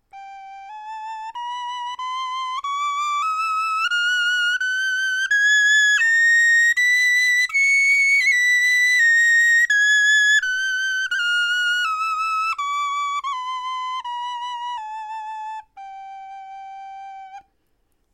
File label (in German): tonleiter